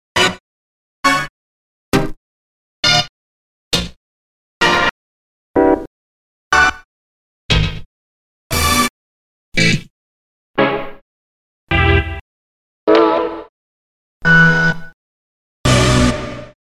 CHORD; Polestar Magnetics The X-Static Goldmine

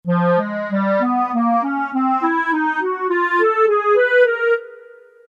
CLARINET; Roland SN-U110-06

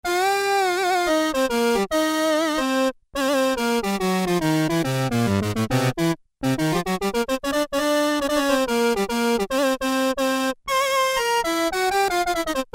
Saxo-Brass, Korg Poly-800

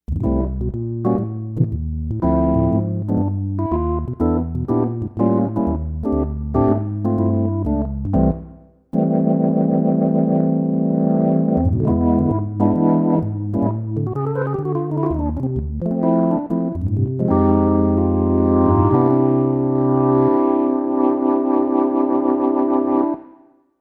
B3 Warm Burn, Novation UltraNova; Daniel Fisher Volume 1

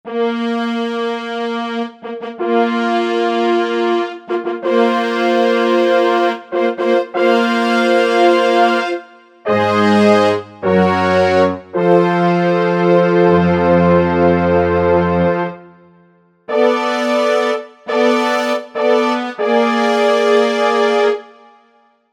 Film Brass

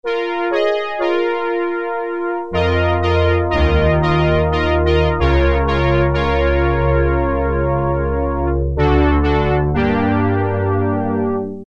Brass Ensemble, Roland Juno-106; Factory Preset

